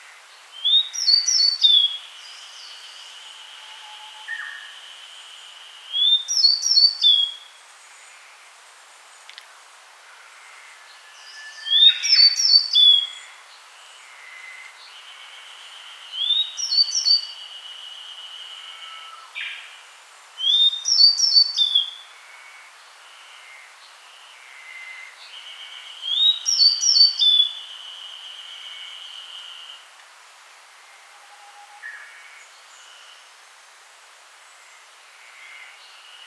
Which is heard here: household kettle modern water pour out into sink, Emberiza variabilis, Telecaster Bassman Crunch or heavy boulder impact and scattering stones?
Emberiza variabilis